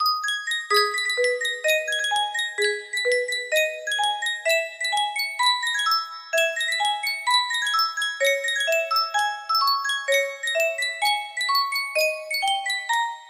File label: music box